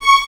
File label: Violins C#6; Miroslav Vitous; String Ensembles